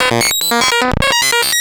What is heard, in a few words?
Atonaloop, Industrial Analog; Analoops; Sonic Foundry (Sony Creative Software)